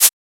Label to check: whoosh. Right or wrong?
Wrong